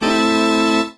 the 60's usa asterisk; windows 9x; sound schemes

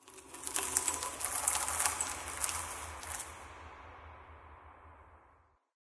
amb rock debris, ambient organic; video games; source engine; portal2

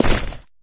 wet, footsteps

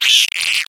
egg pain

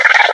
box monster chitter

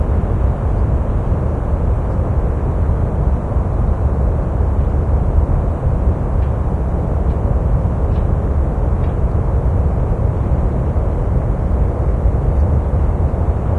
urban rooftop ambience loop; video game; Left 4 Dead 2; Source Engine; ambient

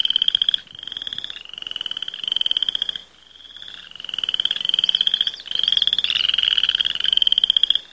animal call frog loop; Source Engine; tf; lair

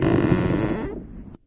creak, strider; Source Engine; video game; npc

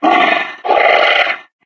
yeti statue growl; Source Engine; tf; lair

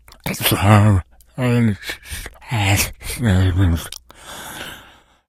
mumbling, infected idle

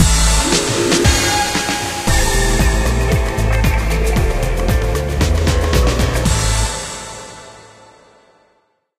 disco; video games; player; taunt; source engine; tf

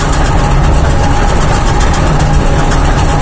paint shaker big lp, industrial; video games; ambient

